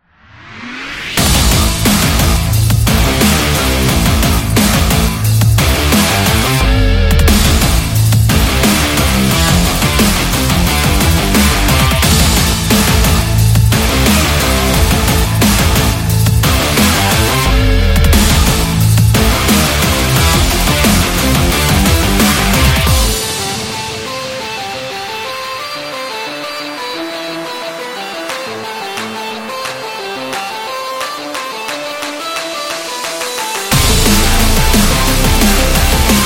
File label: Videogame